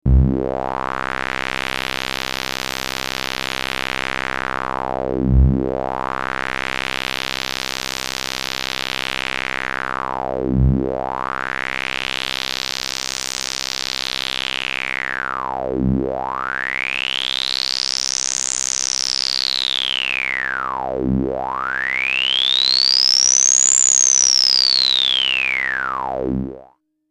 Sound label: BPF8; deMOON